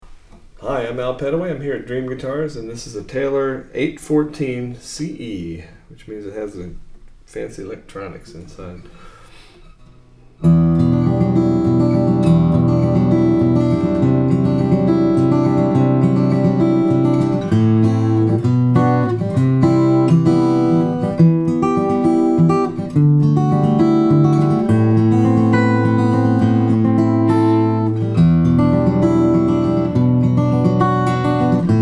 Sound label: taylor 814ce, steel-string flattop guitar